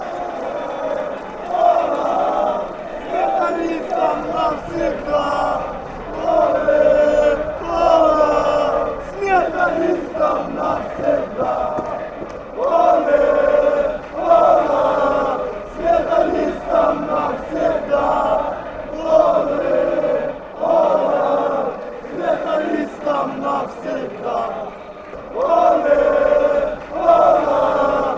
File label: chant; metalist